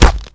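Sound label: crossbow hit body; valve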